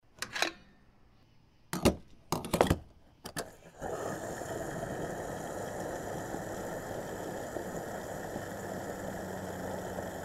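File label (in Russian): звук заправки машины бенз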